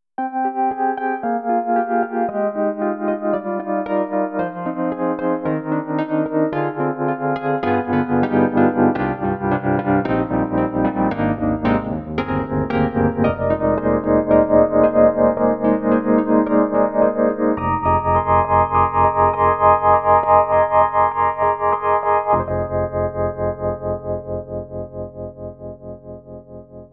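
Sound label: tremolo Wurlitzer, EDIROL SD-90; Tremo Rhodes; Tremo Wurly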